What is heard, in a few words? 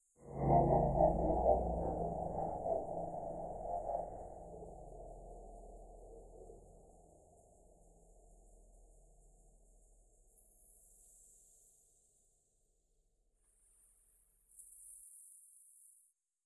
crunch strata